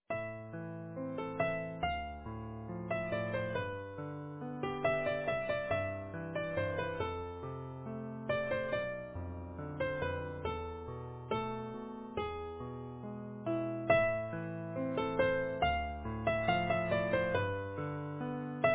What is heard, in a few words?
Easy Piano